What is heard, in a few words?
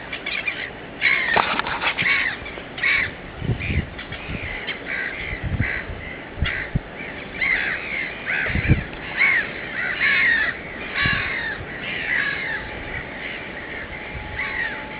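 black-headed gull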